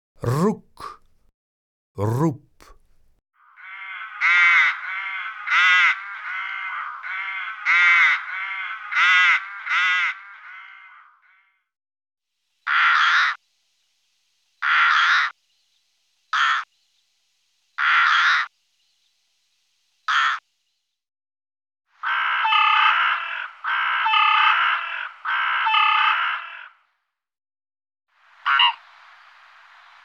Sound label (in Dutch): Roek, Zangvogels; IVN